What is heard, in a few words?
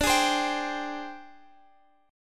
G sharp Major 7th Flat 5th